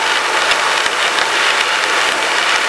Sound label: Pack Foley Short; XBOX; Amped 2